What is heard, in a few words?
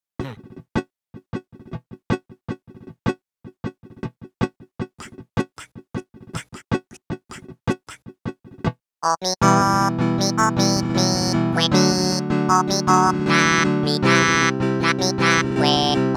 Flamenco; K.K. Slider complete songs collection